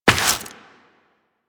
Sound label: Step Rock, Footsteps Walk; DE; Warframe; Tenno